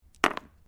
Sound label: Dice mixed game board throw; SoundEffects Foundation2